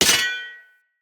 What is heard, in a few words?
Short Sword Medium Parry Impact, Weapon Impacts; Player; Swords; Combat